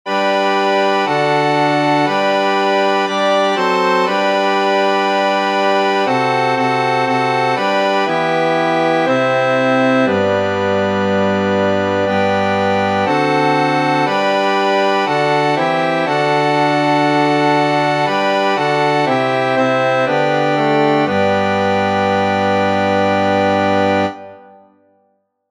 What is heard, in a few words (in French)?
psaume; dimanche des rameaux